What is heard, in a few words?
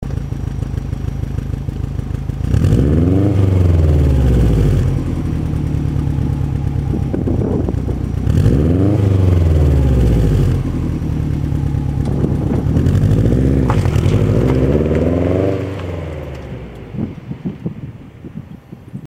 3000rpm OUTSIDE